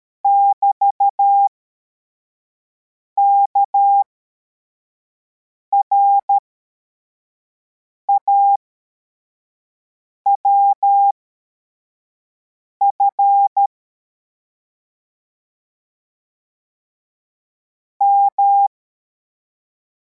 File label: cw, morse; formation